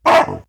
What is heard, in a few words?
GOOD ALARM, Baboon